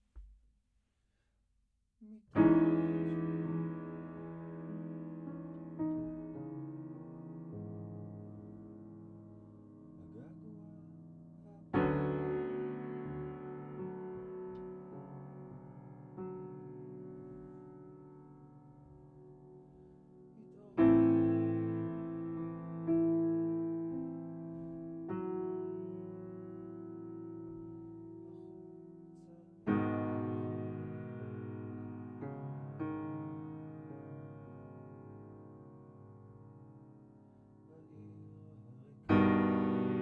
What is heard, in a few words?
Piano Low